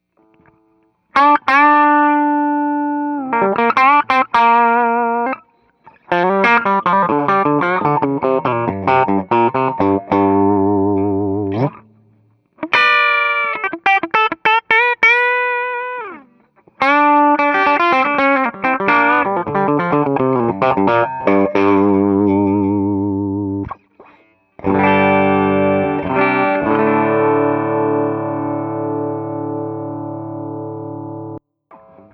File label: Les Paul Lo Hi; Les Paul Lo Input & Hi Input; Custom Built Point to Point Hand wired boutique amp